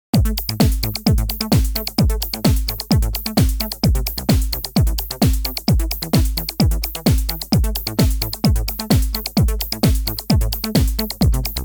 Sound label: Technoid